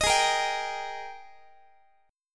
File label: G sharp Major 7th Suspended 2nd, Guitar; G#M7sus2 Chord